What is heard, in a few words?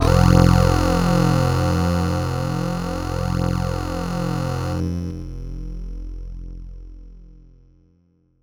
bass F1, PPG Wave 2.2